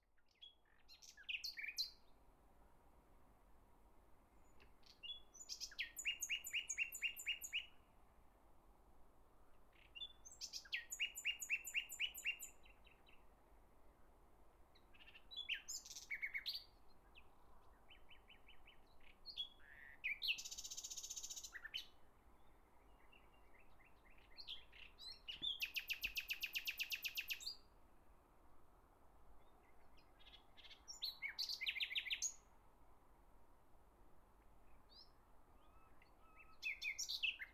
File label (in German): Frühling Nachtigall